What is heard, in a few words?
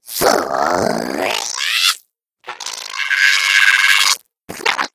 eggbot trick fire